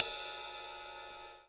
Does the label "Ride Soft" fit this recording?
Yes